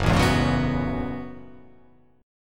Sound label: Gb Minor 11th Gb down; Gbm11; strummed; JGuitar